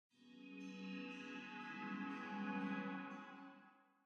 strange echo; pirate mysteries android ios macos mobile windows gamerip 2012

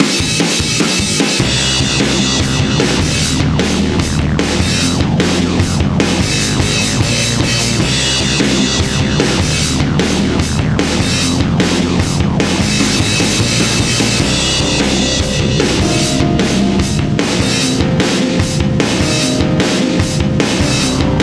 Sports Room, reveal music

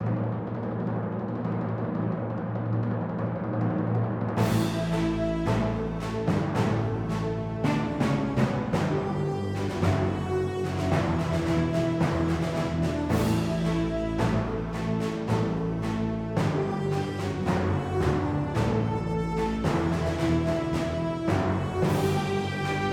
Music Start Menu Good

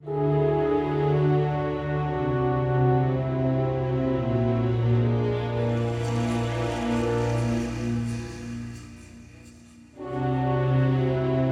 bgm interval

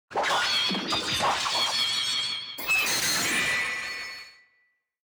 Battle Special Move

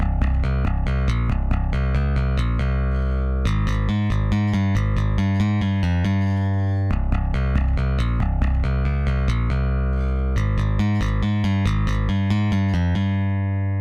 Drums and Jingles Camping Bass B

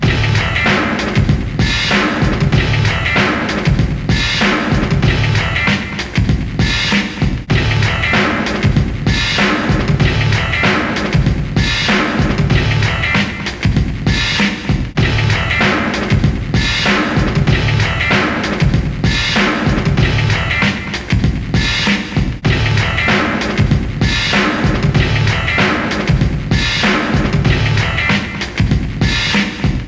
Ditty; A Building Full of Cats

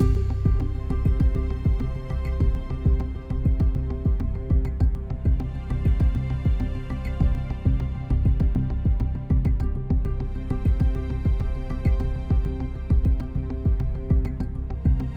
Mood Normal Medium

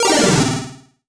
MUSIC EFFECT Solo Chip C64 Negative (stereo); startide macos switch windows gamerip 2017

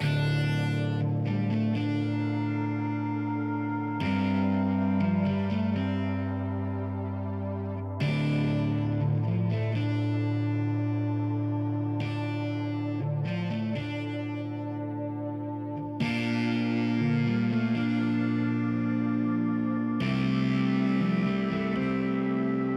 Ambience; Landinar Into the Void

